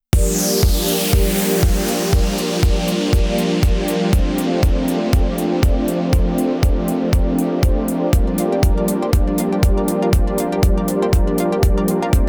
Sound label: Synthwave